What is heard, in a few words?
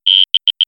hourglass treefrog